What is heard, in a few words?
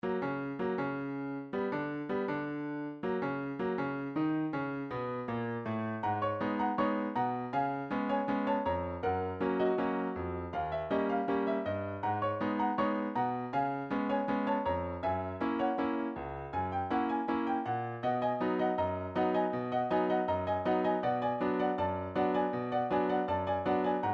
piano